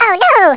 expl all; Dynamite

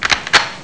add bomb; Dynamite

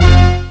Indigo, Beeps Musical; m68k-amiga-OS

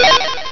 bonus; Dynamite

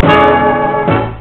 countdown go; Dynamite

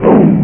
bombs 2 blocks; Dynamite

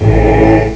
Chant, Beeps, Musical; m68k-amiga-OS

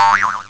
Boiwoing; Beeps; Boings; m68k-amiga-OS

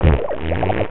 Bubbly, Beeps, Rude; m68k-amiga-OS

